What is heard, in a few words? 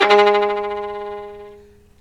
STR Violin, Solo Violin FX